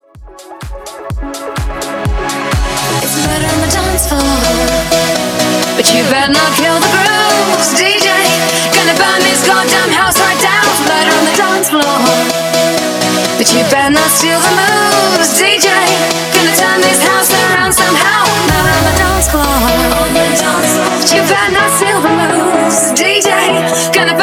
Bass House Quick Cuts 126

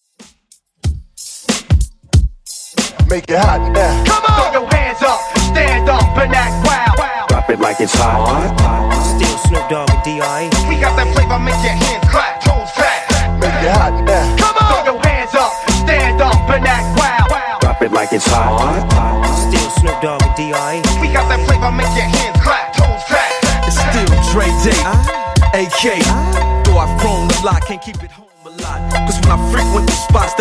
Hiphop Hype In 93bpm

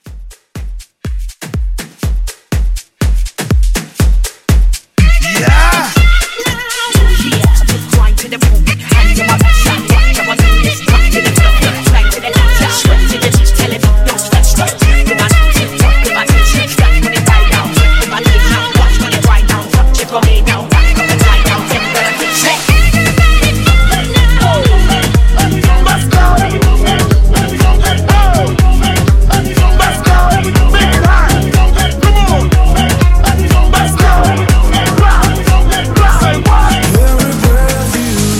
Club Mixshow Break, 122

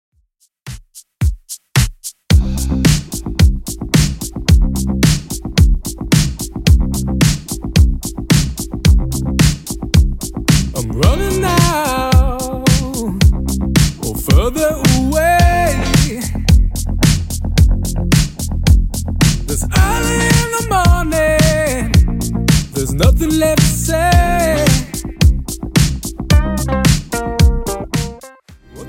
110bpm

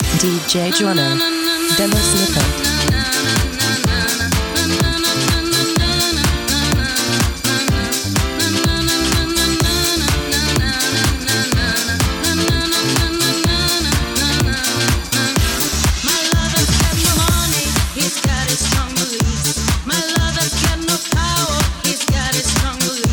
125 bpm Dm; Redrum

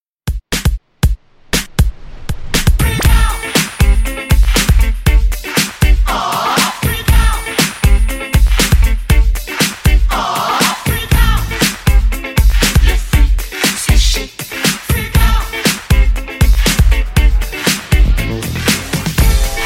Remix; Redrum

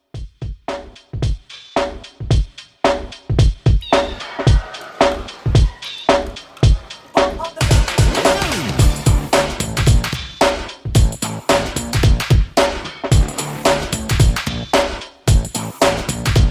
90s Funk, 111Bpm; Redrum

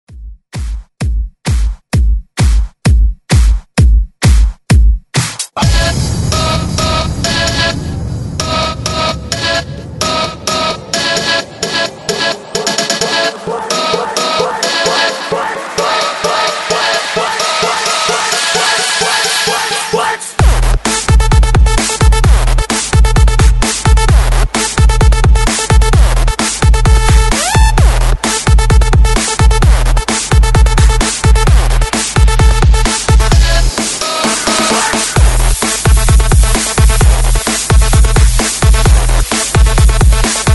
130Bpm BREAKMIX; DJDOLLS

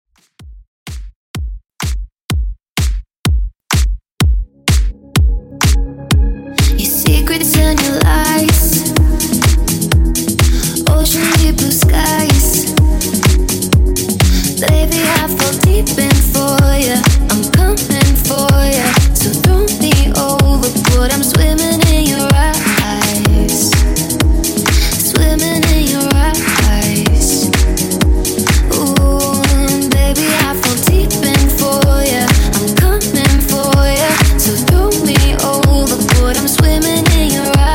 Electro House 126Bpm

